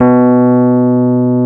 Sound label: electric pianos; Wizoo Electric Pianos